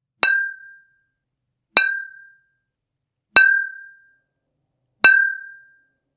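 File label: glasses sound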